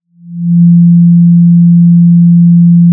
Sound of a cruise ship horn

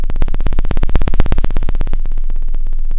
center, Sound of a train

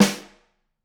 Snr, drum

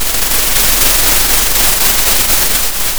pop, Sound of a thunderstorm

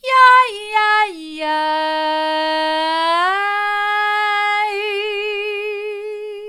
yi yi yi hard, vocal

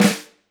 Flam, drum

